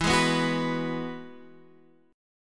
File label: E Major Add 9th; Eadd9 Chord {X 7 6 4 7 7} strummed